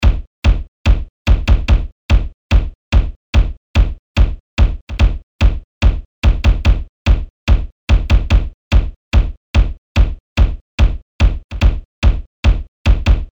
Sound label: Chunk Kick; Industrial